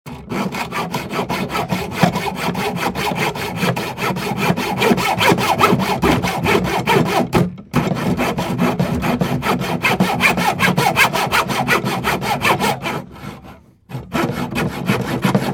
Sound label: sawing wood